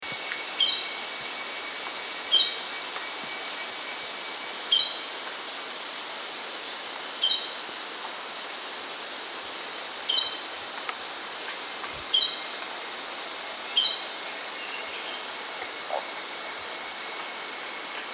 suara cucak hitam call

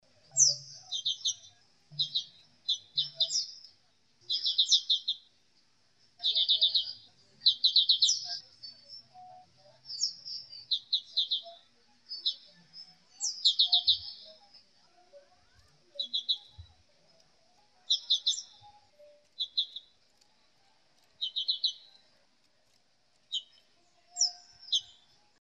suara pleci dahi topi hitam

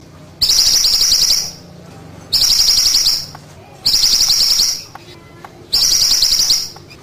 mantenan betina

